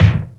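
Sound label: Drumulator kick; Hollywood Edge; The Big Bad Beatmachine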